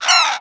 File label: Vulture Attack; Playable Tutorial Level; TR3